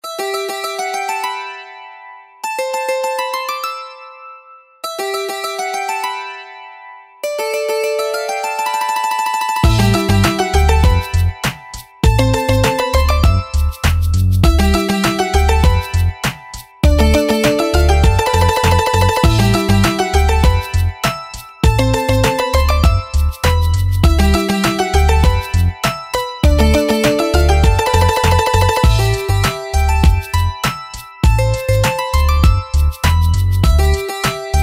instrumental

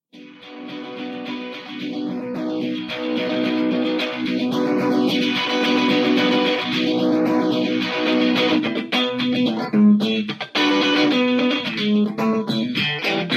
russian funk

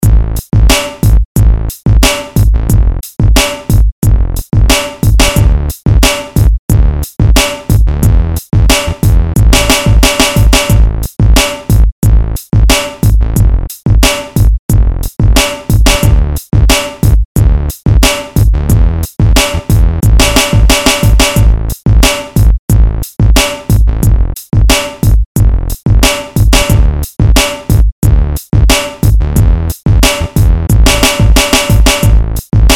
gangsta instrumental

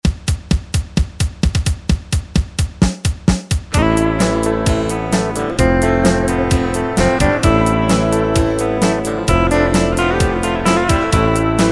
clean music

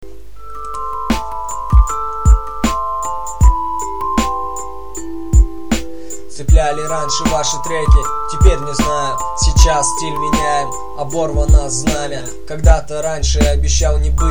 hiphop rap battle

